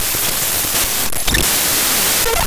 notepad, Files From My Computer Converted Into Sound; lolicore